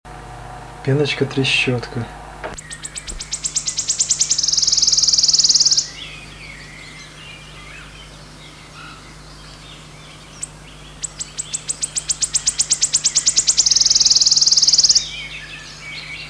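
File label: wood warbler voice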